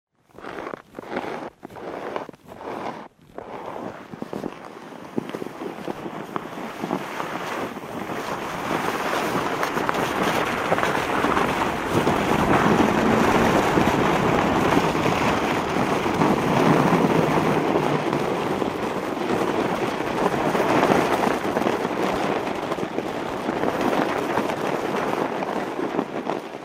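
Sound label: sled going down a hill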